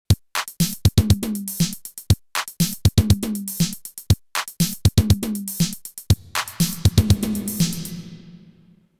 Beat

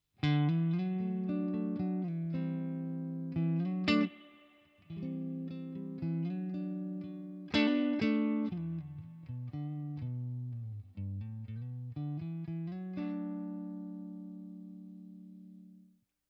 6V6 CLEAN rev; Boss Nextone

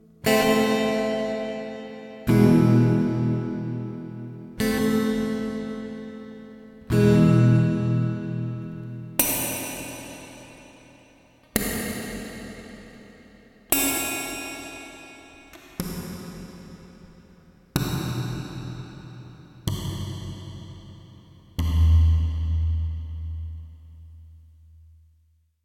Les Paul; c400 fast track; FX 100 Prozent

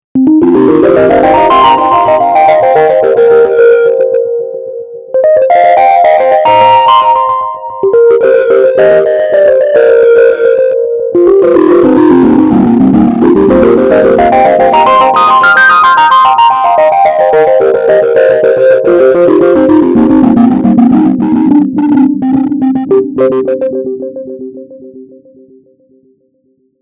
Delay Distortion

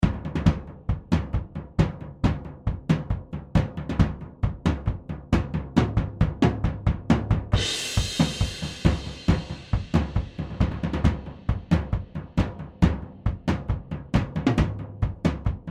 Chandler TG Drums; FOK System A

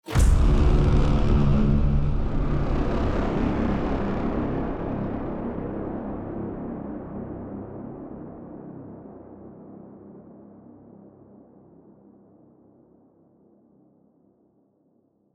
Sound Design Braaams Full Mixes

